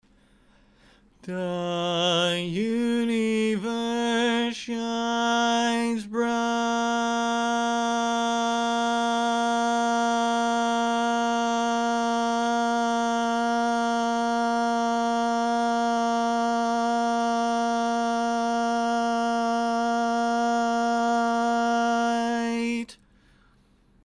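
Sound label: Baritone, barbershop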